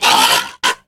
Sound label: die, aliens; ufoai